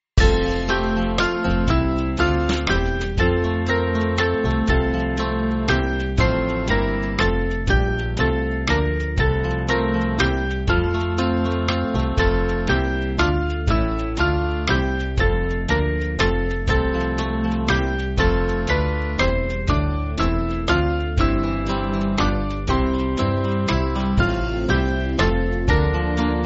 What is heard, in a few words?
Band; Lord Behold Us; Tonbridge School